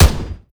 ar70 fire; STALKER

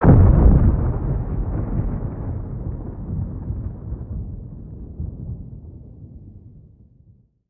blowout Start; STALKER

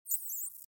rat idle; STALKER; customnpcs

